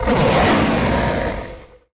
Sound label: monster